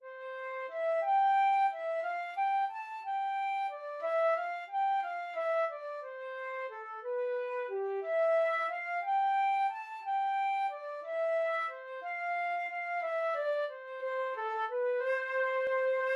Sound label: flute trio